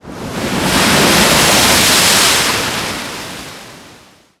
Waves Heavy, ambient; Totaled; XBOX

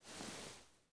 body Slide smooth

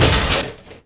locker pry

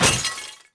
Pott Clps Sm; objects; Star Wars Obi-Wan; XBOX

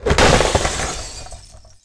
board throw down; skate shop; Tony Hawk's Project 8; XBOX

